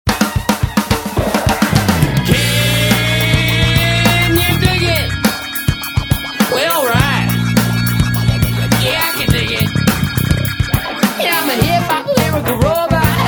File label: HipHop Lyrical Robot; EQ Africa